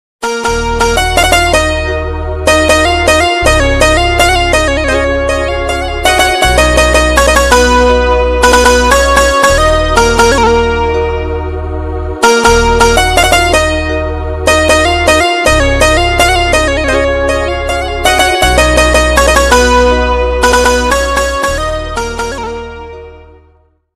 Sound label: sambalpuri flute ringtone new sambalpuri flute ringtone bgm song